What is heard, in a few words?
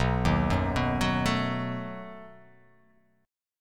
B 7th B down